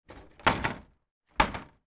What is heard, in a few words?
industrial wheelie bin lid open close; sound effects one